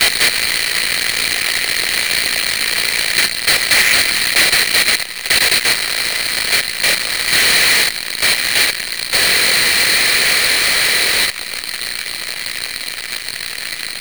static

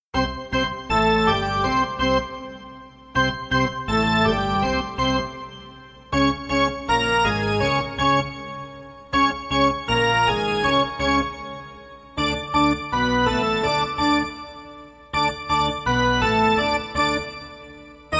Arena Organ

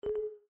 Windows User Account Control

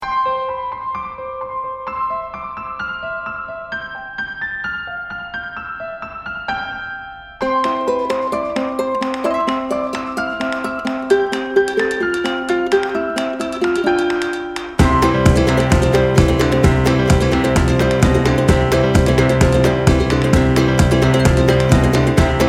schoenhut

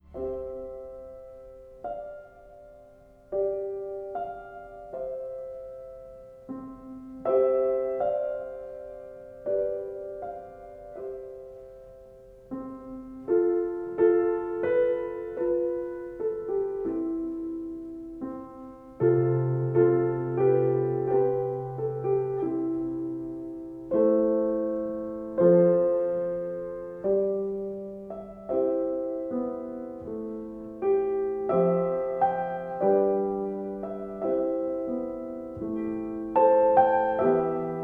PIANO

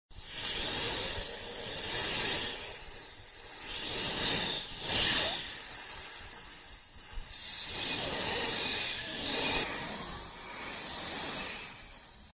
SNAKE HISSING

